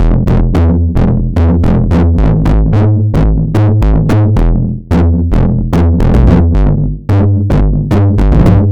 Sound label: Bass Line; Eclectic Electronica